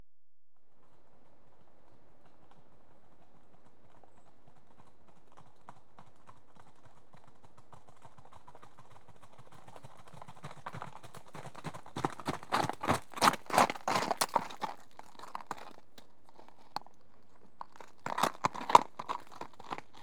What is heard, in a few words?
Two horses at trot on gravelly; BBC Sound Effects Library